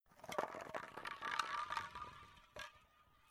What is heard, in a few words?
Spray Can Rolled Across Concrete Metal Rattle